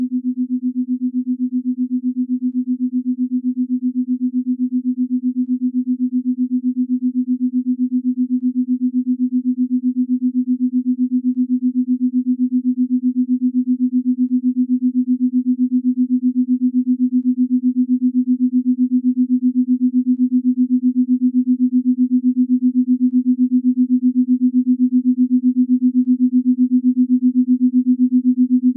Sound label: Schumanne resonance